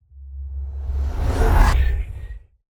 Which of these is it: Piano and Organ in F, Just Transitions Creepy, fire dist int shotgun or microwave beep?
Just Transitions Creepy